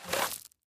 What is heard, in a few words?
paytable Button Click; colossal pinatas